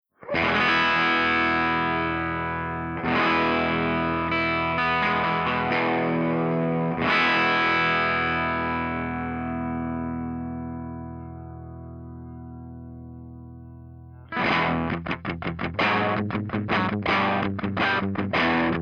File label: FENDERTWIN DRIVE HB